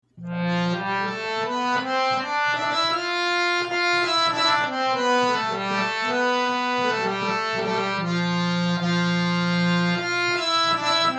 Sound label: harmonium